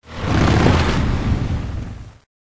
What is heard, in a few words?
HIT DUST; Full Throttle; ScummVM